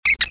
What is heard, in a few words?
Squeak; Cookie Jam